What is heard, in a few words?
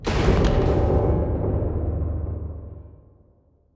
damage hits explosions, Warships